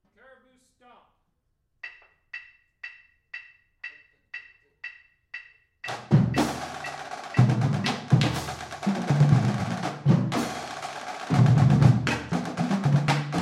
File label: stomp